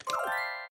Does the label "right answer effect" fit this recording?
Yes